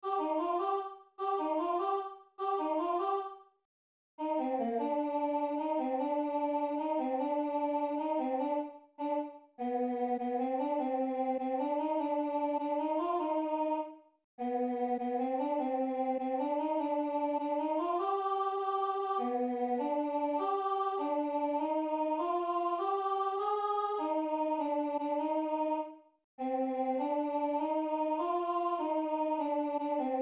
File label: stabat mater alto